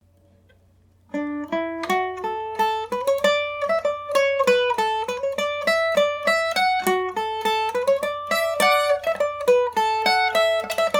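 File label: D major md304, Irish mandolin; lakes of sligo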